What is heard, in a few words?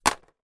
household telephone receiver place down slightly hard plastic modern